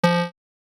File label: Shopping store checkout scanner error